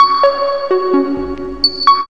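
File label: bell sequence; E-Lab; X Static Goldmine 1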